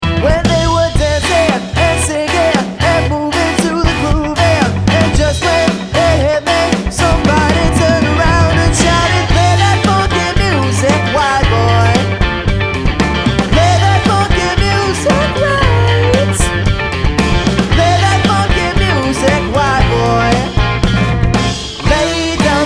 Rock Pop Band; Somerset

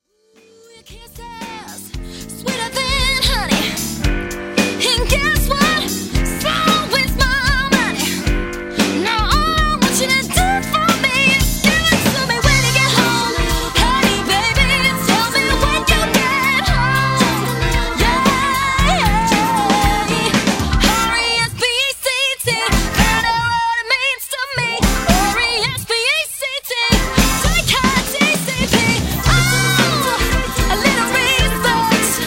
Rock Pop Band; Surrey

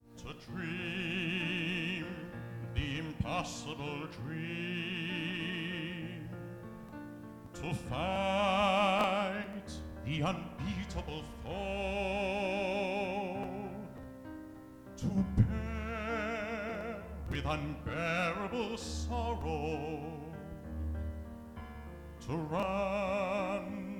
Singing Waiters, London

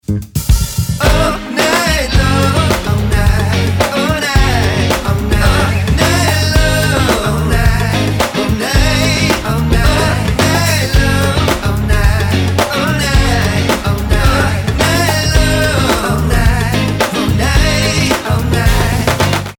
Rock Pop Band; West Sussex

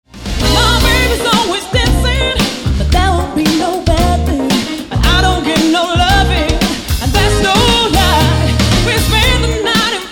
Soul Band; Surrey

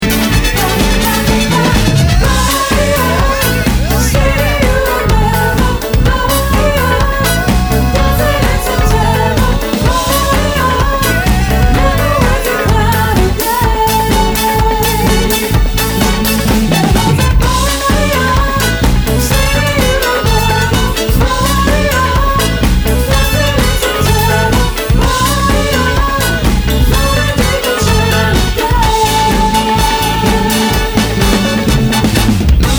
Rock Pop Band; Staffs

